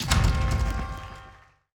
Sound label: bong